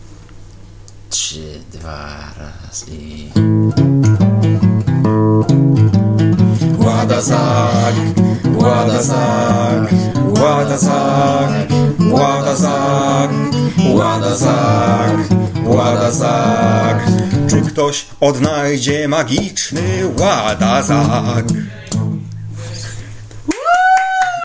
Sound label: blues